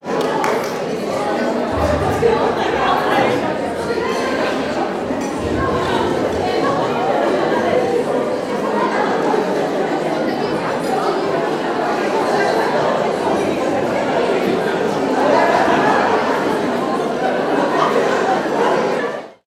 Women Crowd

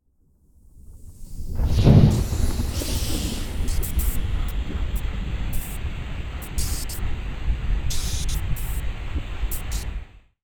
Rocketship Hover Landing

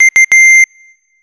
Oldfashioned Message Arrival

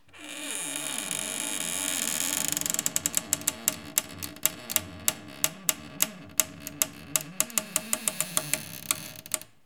Long Scary Hinge Creak

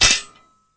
Impact Shatter

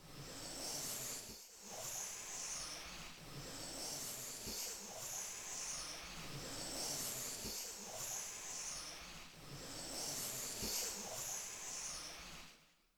Slide

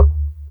Chair

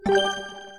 Menu Validate

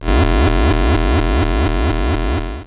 Retro Space Wormhole

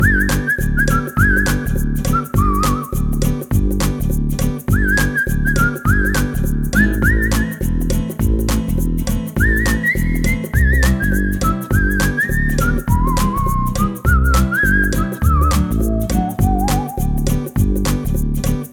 Whistling Song Loop

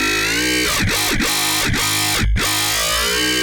Real Wobbles

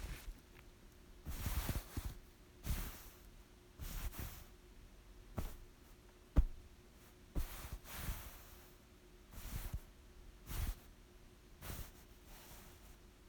Misc Fabric Pats

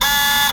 Electromechanics

